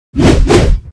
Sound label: ATTACK